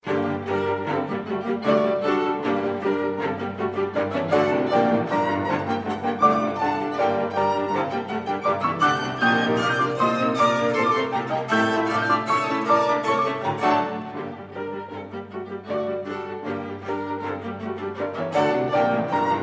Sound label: Piano Quartet No. 1 in G minor, Rondo alla Zingarese, Presto; Brahms; Op. 25